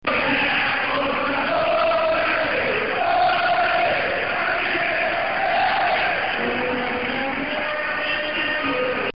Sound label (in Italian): cammineremo con te, cori